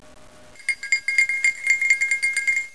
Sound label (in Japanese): suzu